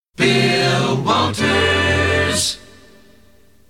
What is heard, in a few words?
jingle